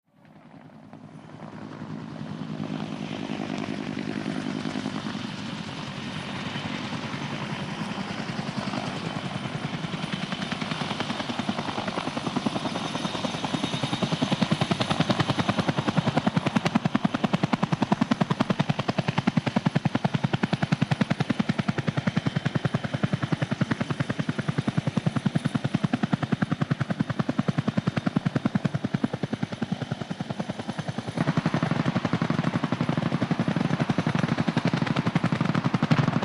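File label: AH1 Hover Steady Machine Gun, AH-1 Cobra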